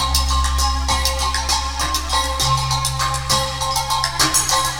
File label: Another Cognition 100, Percussion Combos, Abstract World Fusion; M-Audio; Pro Sessions VOL-38; Abstract World Fusion 2